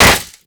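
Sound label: bullet impact paint metal; Modern Warfare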